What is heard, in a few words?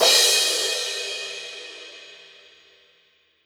crash, drums cymbal; instruments